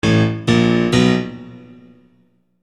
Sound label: Acoustic Piano